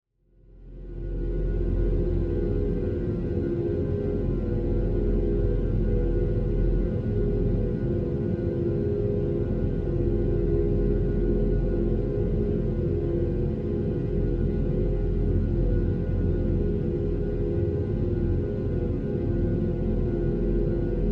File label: Horror Drone Dissonance Ghostly; Scary Tones; Blastwave